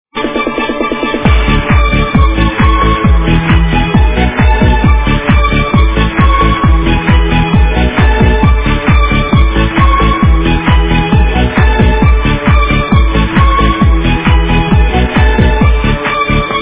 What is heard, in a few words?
TRANCE